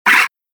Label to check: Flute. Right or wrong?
Wrong